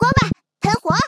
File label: sms; OnePlus; 11 Genshin Impact Edition; xiangling